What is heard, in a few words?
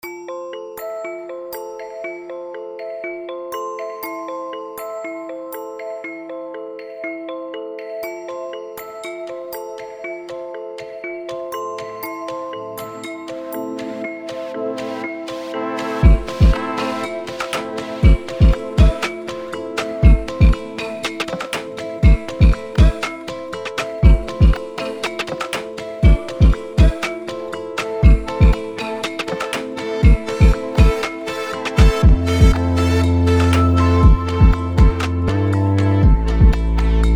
kalimba